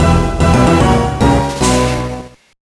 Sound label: Lost Life, gba gamerip; santa claus junior 2002